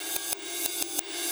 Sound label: Cymbol Particle; Particles